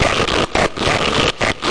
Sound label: 140 hexbeat, DRUM LOOPS and BREAKS